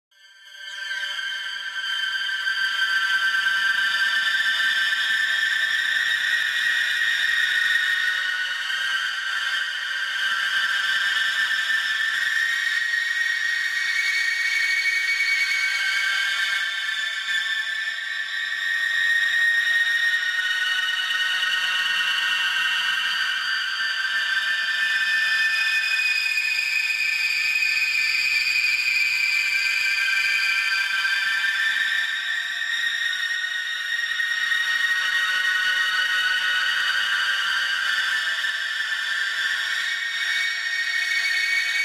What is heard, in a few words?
Pad